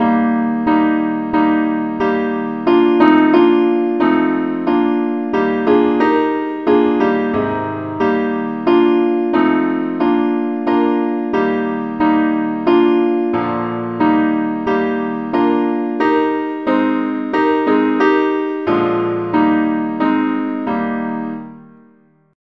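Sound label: simple acc, key E flat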